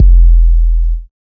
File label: PHASE 130 BD, Big Beat Drumloops; USB Soundscan vol.25; AKAI